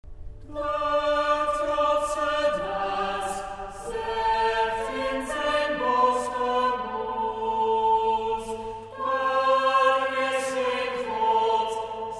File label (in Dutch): Psalm vocaal